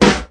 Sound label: original snare drum sound e key